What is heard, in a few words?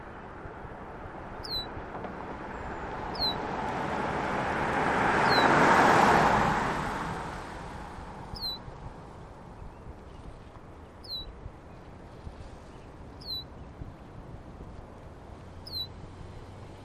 car Passing; Birds even sing to a car on the road; Spring trip to Yosemite Valley